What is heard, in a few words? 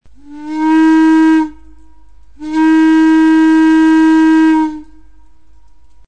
whiz, whistle